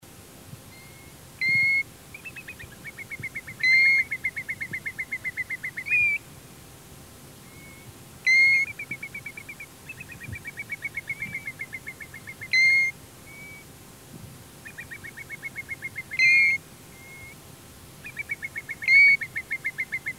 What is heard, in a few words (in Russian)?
sredniy kronshnep golos